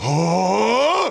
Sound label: scream; yamcha